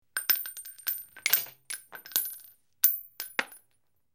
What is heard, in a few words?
Multiple Shells Ejec; mvmt